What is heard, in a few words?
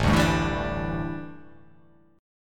A 7th Suspended 4th, A, down